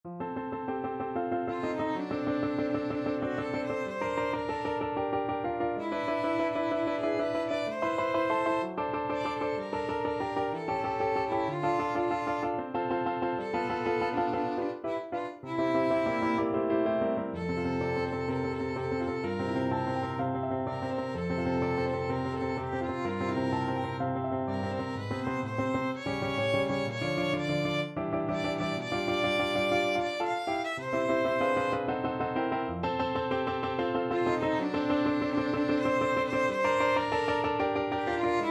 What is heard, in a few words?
schumann fruhlingsnacht violin